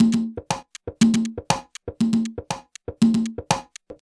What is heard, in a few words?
Conga Standard; Drums